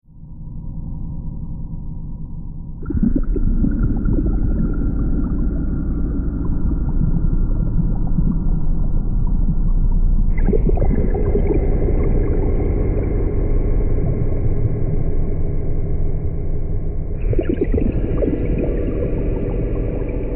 Submarine Underwater SciFi Mystical Bubbles Diving; Ethereal Reverb Scifi Science Fiction; Boat; Vehicles